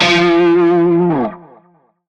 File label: Shawzin Flourish Oct Two F; Music; Warframe; Narmer Shawzin